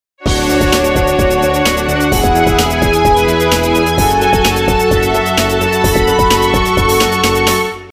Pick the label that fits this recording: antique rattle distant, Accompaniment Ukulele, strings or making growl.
strings